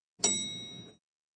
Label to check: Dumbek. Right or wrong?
Wrong